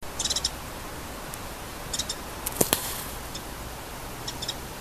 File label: ratchet